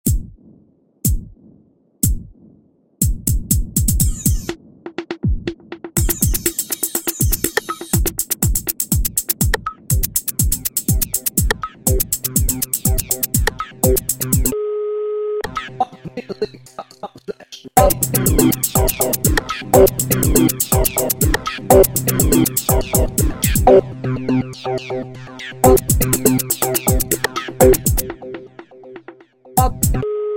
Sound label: Jingle, radio; Warp-x com